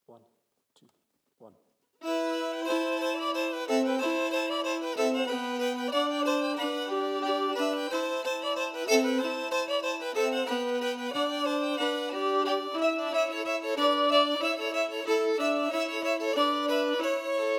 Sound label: harmony emphasized; Da New Rigged Ship